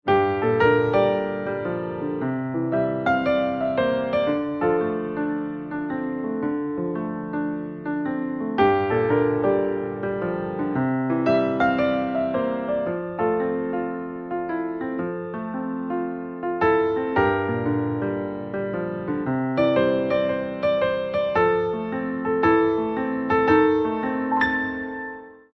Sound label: piano ringtone